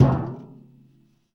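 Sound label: BIG TIN, percussion metallic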